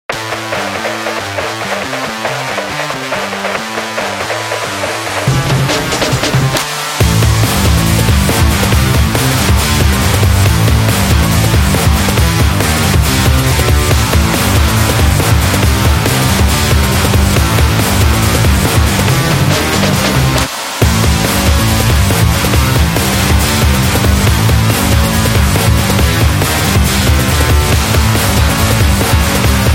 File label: Sport Action